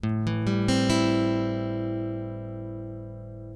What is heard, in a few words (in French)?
accord la mineur 7 lent, guitare